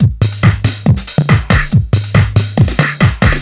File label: DRUM, HOUS-TEC DRUMS; MAGIX